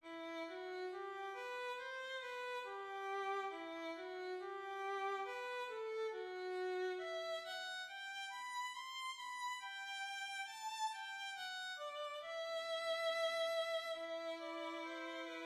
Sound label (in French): VIOLONS